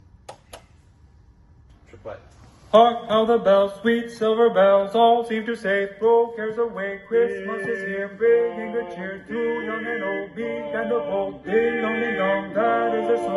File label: Singing Penguin